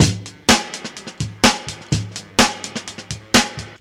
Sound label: 126 bpm breakbeat sample g sharp key, Free breakbeat - kick tuned to the G# note